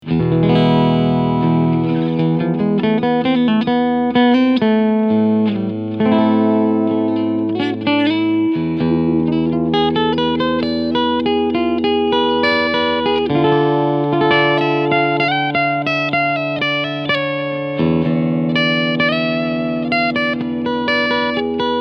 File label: Piezo